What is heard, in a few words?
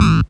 SYN OLD, dance synth